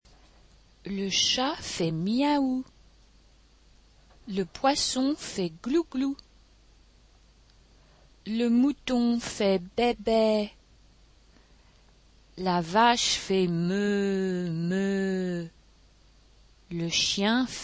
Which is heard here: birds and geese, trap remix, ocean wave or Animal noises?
Animal noises